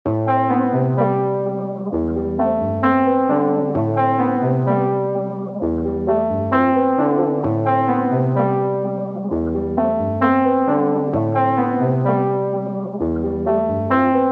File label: 130bpm C major